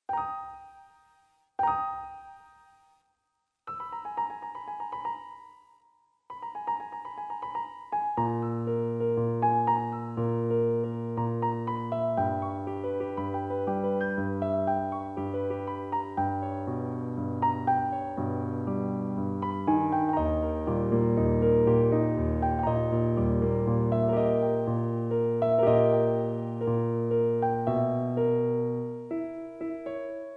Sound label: Piano